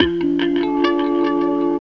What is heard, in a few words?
GTR PIK